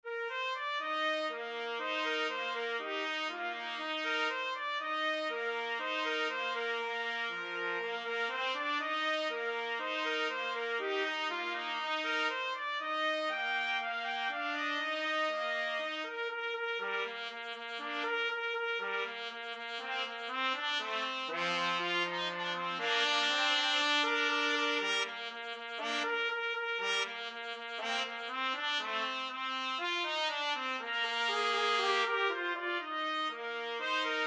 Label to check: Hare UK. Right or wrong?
Wrong